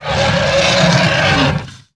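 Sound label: troll hurt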